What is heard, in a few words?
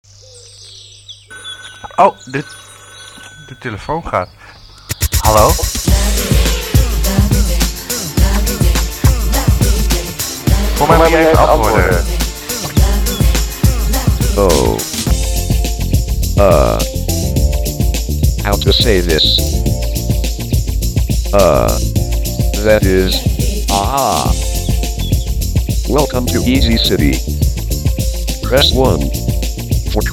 jingle long